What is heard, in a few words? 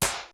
percs